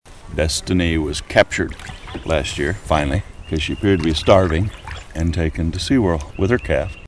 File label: manatee; health